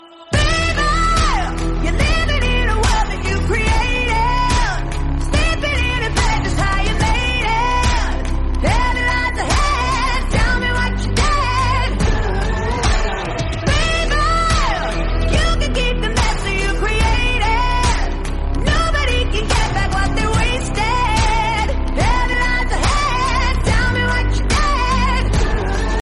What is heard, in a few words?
english song tone ringtone